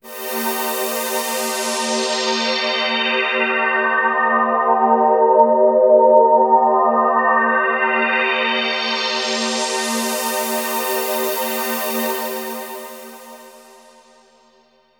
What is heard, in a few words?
synth pad ambient 160 C; Sphere